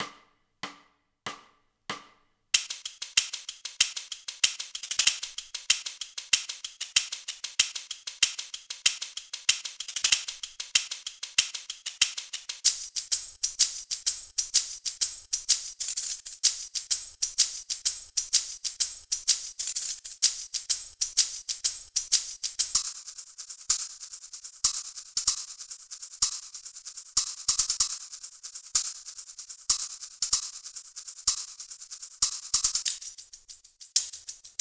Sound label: African Shakers